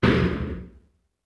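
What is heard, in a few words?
CHARACTER SELECT CURSOR; UFC Tapout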